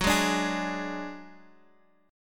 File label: F sharp Suspended 2nd Sharp 5th, Guitar, Half Step Down